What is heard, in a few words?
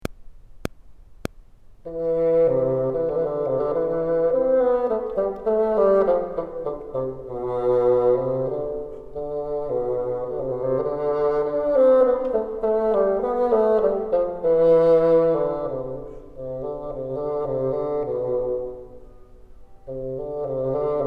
bottom, bassoon